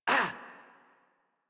Generic Short Pain Operator Voice en; Warframe; Operator Mission

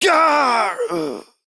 Death, Corpus Male; Warframe; Modular Corpus; Battle Barks; en

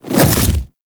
Infested Splitter Melee Swipe; Warframe; Grey Strain

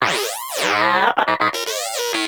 Inquisitive Vocal Battle Ends; Pets; Warframe; Moa

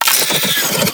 Wall Eye Detatch B; Warframe; Sentient Devourer; Ambience

